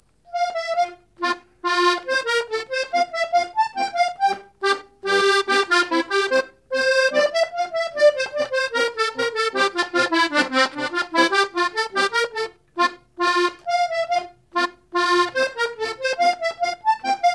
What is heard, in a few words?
the sailers hornpipe snelle versie